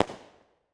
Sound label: Weapon Machine Gun World War II Historical Single Shot Distant; AdobeCC